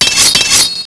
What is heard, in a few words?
clang; fleetworld